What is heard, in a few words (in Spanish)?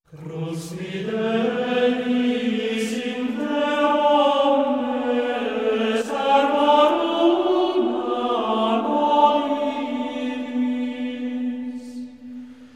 gregoriano